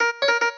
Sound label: cembalo